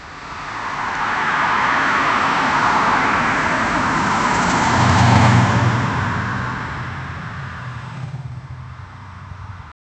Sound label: sound effect of a car, road